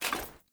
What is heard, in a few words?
Foley Action Armour Run; Player; Deora